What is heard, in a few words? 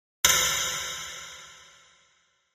Elect Hit Elect Chime, Electronic Hit Electronic Chime; Electronic Hit Electronic Chime with Reverb